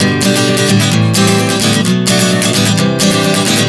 G-Am7-Bm7-C 130 bpm, acoustic guitar chord progressions